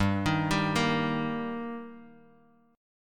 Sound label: G Minor Sharp 5th G down Guitar Open D